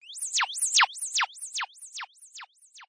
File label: alpha fx delay, Electro FX; Zico Alpha Electro FX